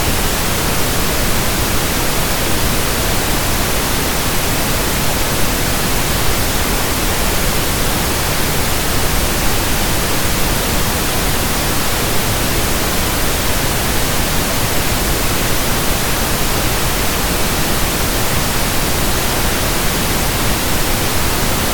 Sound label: pink noise